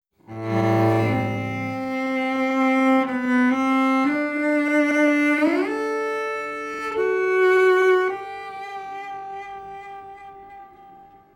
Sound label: Cello 166; Logic